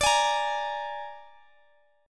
Gsharp Suspended 2nd Flat 5th

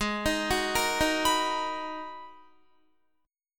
Ab Minor 9th Ab, Guitar; arpeggiated; 4F,4A,5C,5G,5C,6E; 15,18,18,15,15,19; JGuitar

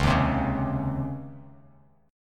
Db Major 7th Suspended 2nd Db Bass Standard; DbM7sus2 strummed; JGuitar

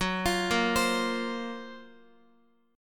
F sharp Major Sharp 11th, Guitar; F#M#11 arpeggiated; x,x,6,10,10,8; 4F,4A,5C,5G,5C,6E; chord shape

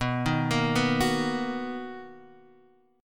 B Major 7th B down; arpeggiated; JGuitar

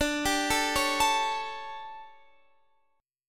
D-Major 7th Suspended 4th, D, Guitar, Standard; DM7sus4; arpeggiated; x,17,17,14,14,17; down; capo=3

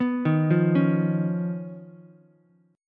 Eb 7th Suspended 2nd Sharp 5th Eb, Ukulele; Eb7sus2#5 arpeggiated; JGuitar

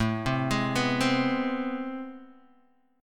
A Minor Major 9th, down, Guitar, Open D

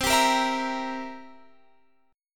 G Minor 6th C; Gm6/C; strummed

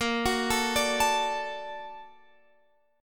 Bb Augmented Major 7th Bb, Guitar Open F; Bb+M7 arpeggiated; JGuitar

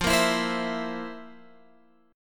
F Major 7th Suspended 4th, Guitar, Open G

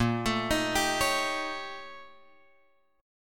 A sharp Minor Major 13th, A sharp; {6 X 7 8 8 9} arpeggiated

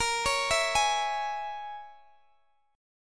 A sharp Minor 7th Flat 5th, Guitar, Open G

